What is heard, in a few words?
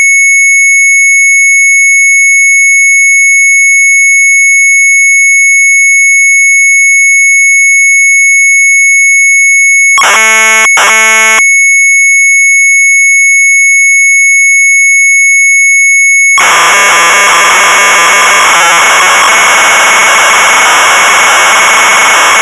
MZ800 Tape, sharp; MASTERMIND; retromelsarchive